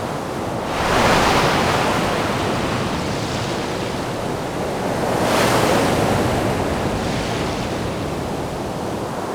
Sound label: ocean